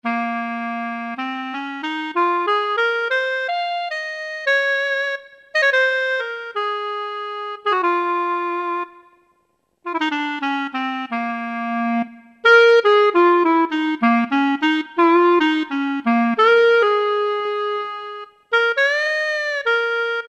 WN Clarino, Yamaha TG77; Factory Presets

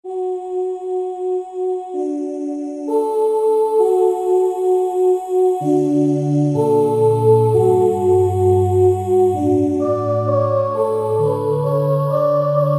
Angels, Korg M1; Factory preset examples

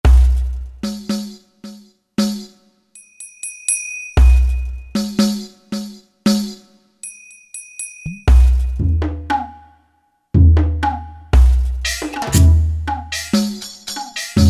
ETHNIC, Factory Rhythm Sets, Roland SC-880

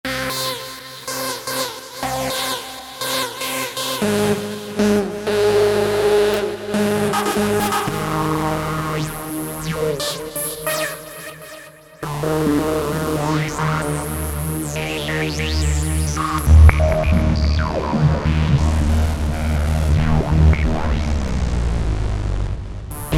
Retro Rave

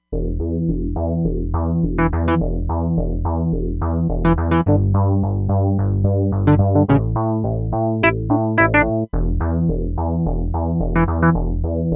Eyes Bass, Roland Fantom XR

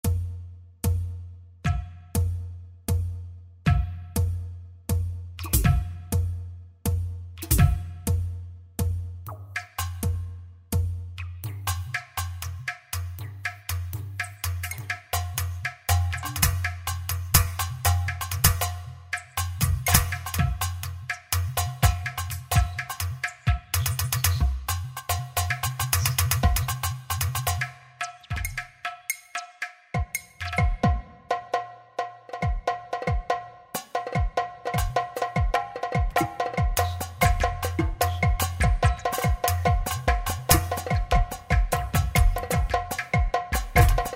Jungle Jam, Kurzweil K2000; Kurzweil K2000 Contemporary ROM

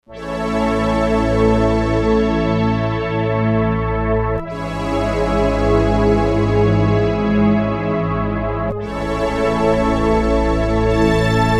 Syn Orc Mix, synth

